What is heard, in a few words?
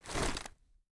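gear rattle weapon large; viper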